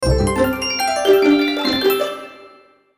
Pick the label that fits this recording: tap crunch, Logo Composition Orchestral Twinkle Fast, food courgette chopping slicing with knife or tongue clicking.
Logo Composition Orchestral Twinkle Fast